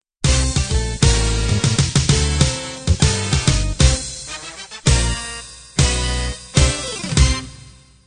swing band end

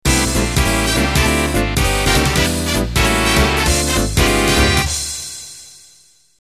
Quickstep intro; Quickstep Y

